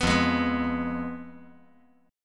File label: F Diminished